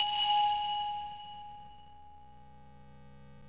brick; stardunk ios gamerip

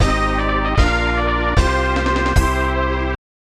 Victory!; RPG Maker 2; SNES

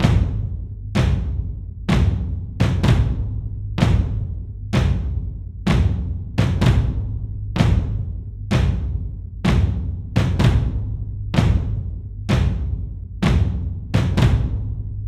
Drums; For Honor

